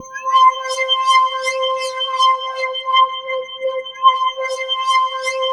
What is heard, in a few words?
C5, SynthLine; 90 s Sample CDs; NiceBeats; SynthLine VOL-5